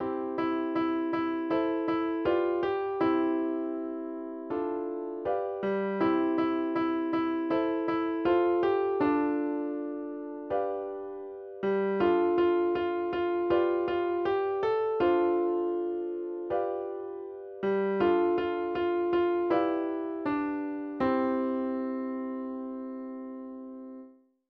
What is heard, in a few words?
melody